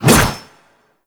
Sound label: Reaper Melee Whoosh; Warframe; Wraith